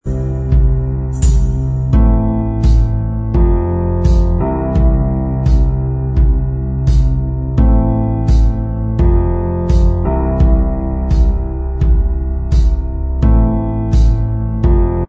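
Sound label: instrumental Track, backing tracks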